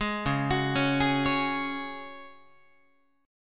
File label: C sharp Suspended 4th C sharp Guitar, chord; arpeggiated; 11,9,9,11,12,12; 4A,4E,5A,5D,5G#,6C#